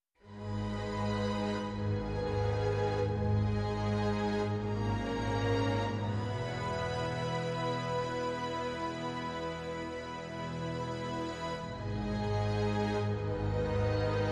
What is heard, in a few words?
Orchestral